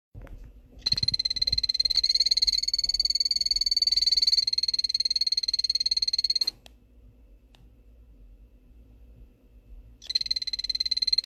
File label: epipedobates anthonyi calling